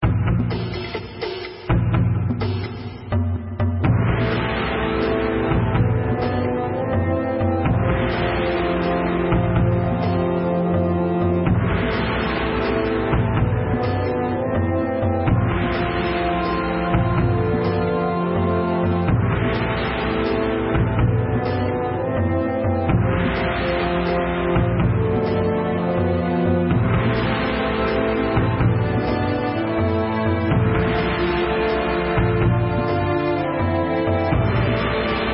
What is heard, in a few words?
game over